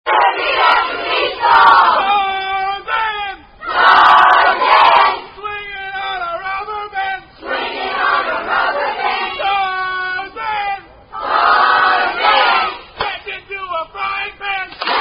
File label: Camp Songs; Tarzan